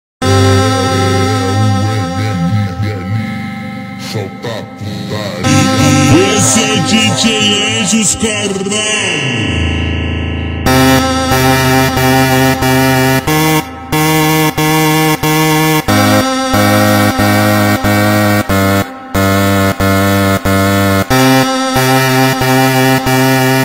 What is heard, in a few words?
instrumental version and ultra slowed